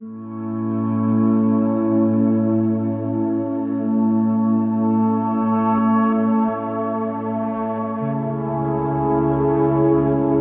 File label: Meditation Background